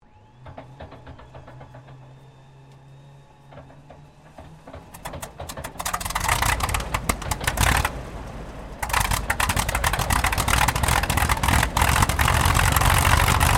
AIRCRAFT HAWKER SEAFURY STARTUP, Hawker Seafury fighter-bomber; Airplanes; Vehicles